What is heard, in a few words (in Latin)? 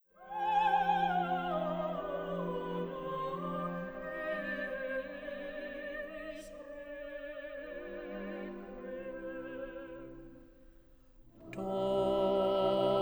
Agnus Dei, Requiem; requiem verdi